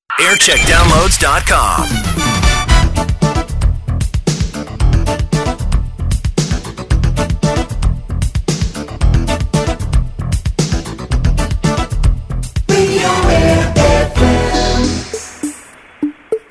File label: Jingles; Aire